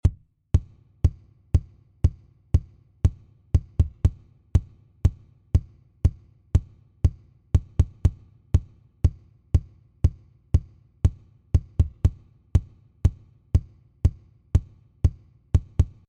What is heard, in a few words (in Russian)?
parallelnaya kompressiya